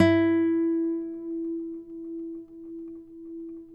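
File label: nylon, FLAMENCO, Guitars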